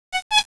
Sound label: bike horn